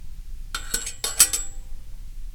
Metal Hooks On Metal

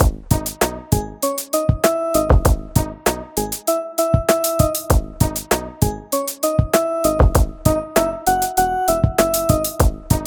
Music Hip Hop Loop

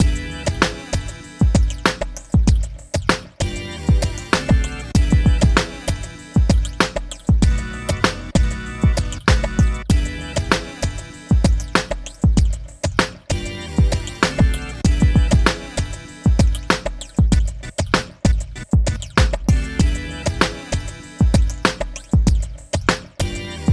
It Goes Down Full 97bpm

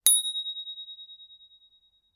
Bell Candle Damper

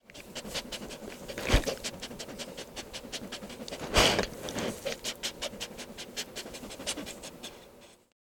Dog Sniffing

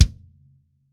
Nice Acoustic Metal Kick

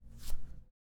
Brush On Paper

